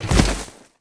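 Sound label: droid body fall large dirt; droids robots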